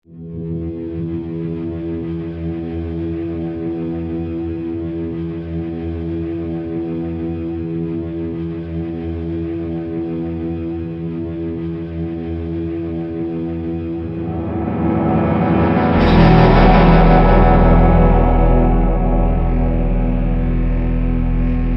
Ambient; Spec Ops The Line

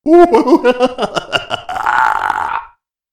Voices Hehe; freaky clown town mystery windows 2020

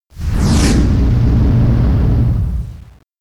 Planet Whoosh; Learn to Fly 3; Moonbreaker

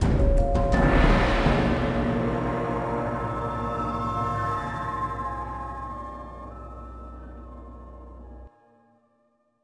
Mystery LOOP END, soundtracks

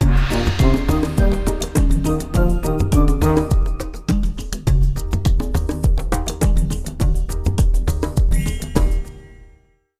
Minigame Hippo Win

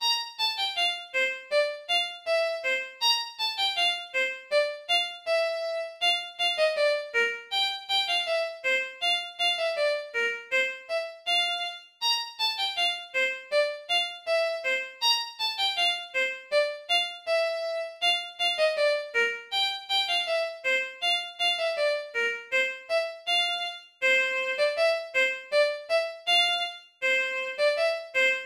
Fiddle Game Unlock Tune

